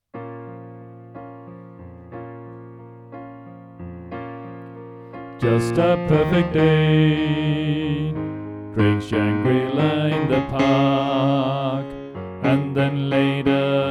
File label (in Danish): Tenor; oevefiler